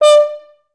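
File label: FHS1 staccato D4, Advanced Orchestra; Best Service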